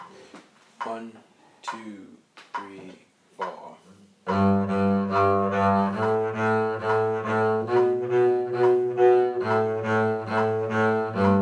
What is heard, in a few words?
night shift bass